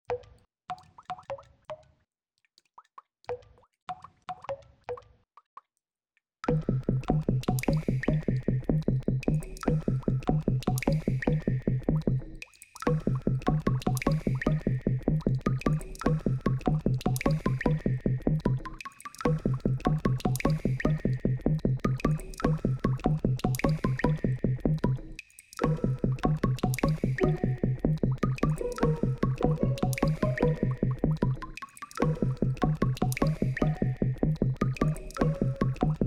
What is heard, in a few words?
water viola; Sampling von Geräuschen; Wasser